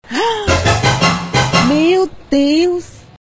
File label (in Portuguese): Suspense chord, Ahm meu deus arpeggio; NOSFERATU SOUND EFFECTS